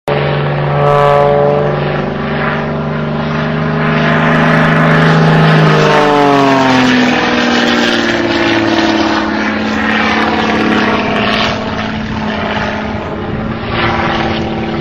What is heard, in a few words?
Extra 300 Aerobatic